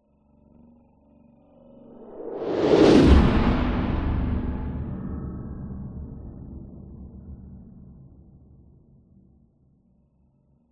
sound design whoosh horror growling into heavy whoosh; zapsplat